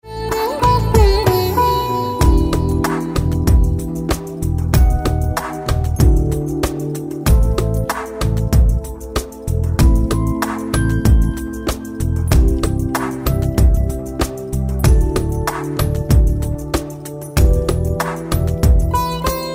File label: Karaoke